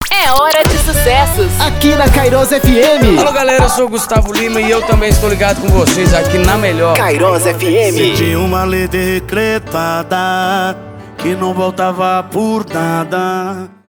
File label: intro music power